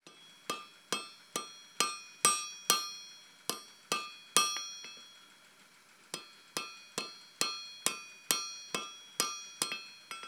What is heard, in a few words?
blacksmith, forge, light hammer, anvil, hot iron, rattle, tap hammer, belts, gears, ambiance; Ilmarinen